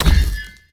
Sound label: Explosion Ice Drip; Boomerang Fu